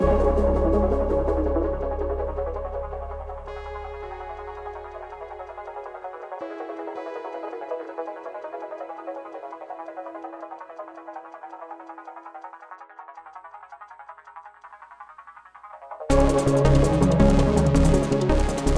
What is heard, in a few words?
164 Bpm; Visi Goes Rogue B 28 Bar